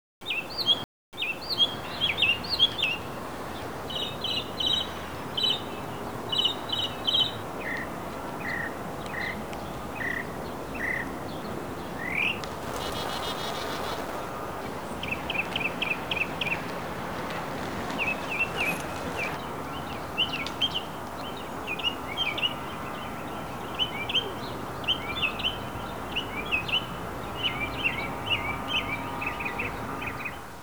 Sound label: MOCK, birds